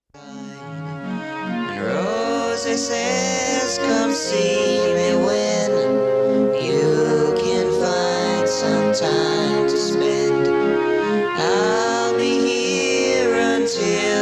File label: higher pitch